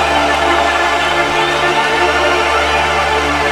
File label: First Drone; JMSL Score Samples; Die Young Drones